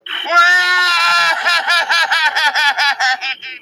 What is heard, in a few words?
crying; gobo fraggle